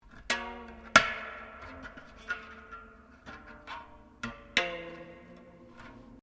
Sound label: HAMMER DULCIMER EFFECT F, Old Chinese Hammer Dulcimer; Strings; Musical